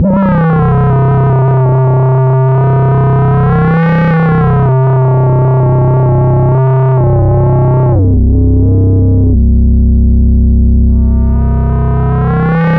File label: C1, SynthLine; 90 s Sample CDs; NiceBeats; SynthLine VOL-21